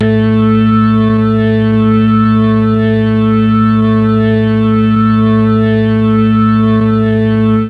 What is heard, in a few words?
A1 organ, mellotron MKII organ